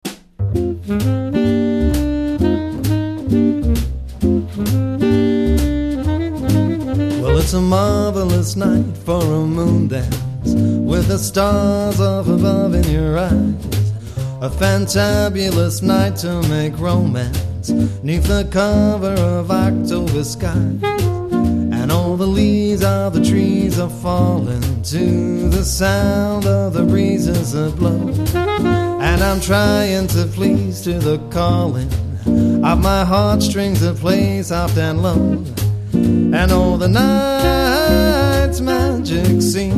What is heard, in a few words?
quartet